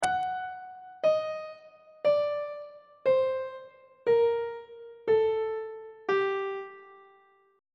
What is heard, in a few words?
g harmonic minor scale descending on treble clef